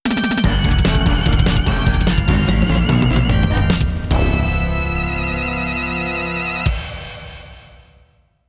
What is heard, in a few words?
Game Start [Roland LA]; Castlevania Chronicles; PS1